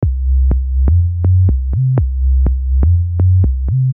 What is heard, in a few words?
123 B Synth Bass